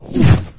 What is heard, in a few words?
scanner near miss; Garry's Mod; hl2